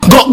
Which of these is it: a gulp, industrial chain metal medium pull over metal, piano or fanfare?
a gulp